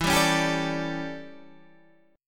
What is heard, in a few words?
D Major 7th Suspended 2nd Suspended 4th